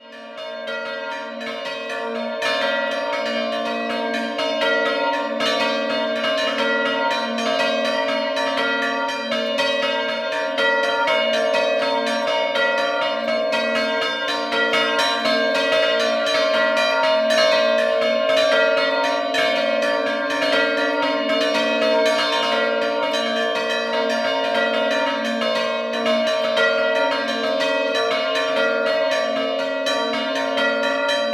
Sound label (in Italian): Scampanottata; campane; Redenzicco